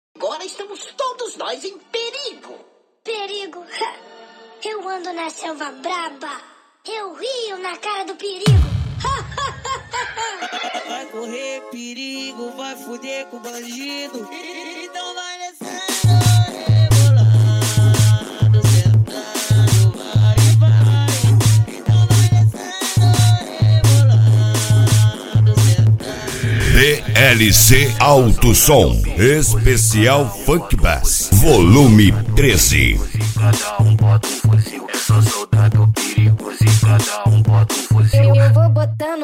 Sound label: funk bass